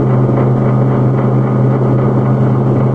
digger idle loop, vehicles; hl2

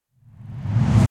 Long Rising Whoosh

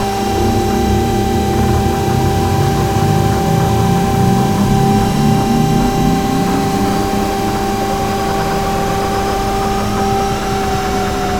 shut down Space Ship; SoundMorph; Solar Sky

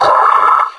die, combine soldier; hl2

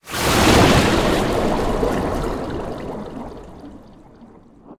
Magic Spells Cast Long Water General; David Dumais Audio

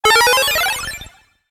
power up level up sound, Retro Game SFX

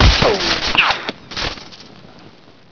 debris, weapons; hl2

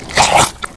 bullsquid chew; hl2

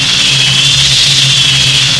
ball loop, assassin; hl2

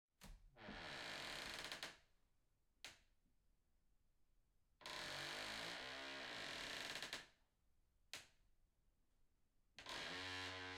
INT HOUSE Clean Wood Creek; Coll Anderson

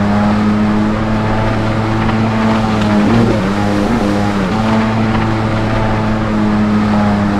jetski throttle loop; hl2

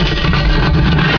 slide, slam, weapons

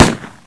smg1, weapons; hl2

